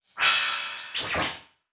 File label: Transition Sweep Laser; Production Element